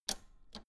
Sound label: old button half pressed